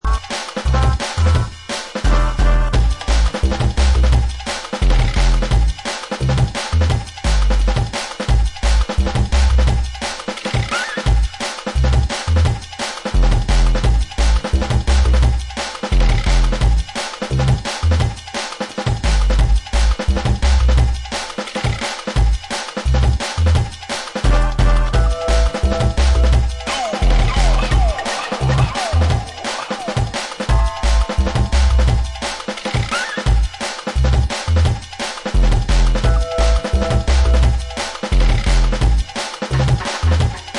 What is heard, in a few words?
Drum Bass